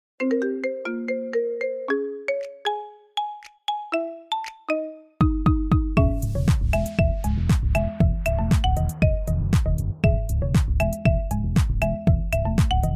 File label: marimba